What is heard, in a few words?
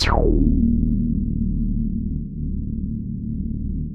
DEEP BASS; Masterbits; Dance Trax